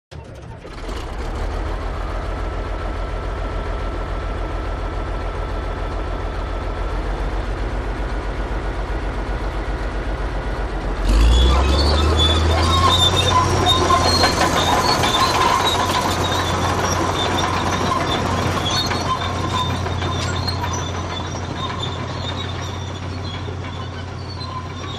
Earth Mover; Earth Mover Start Up And Away With Lots Of Squeaks And Rattles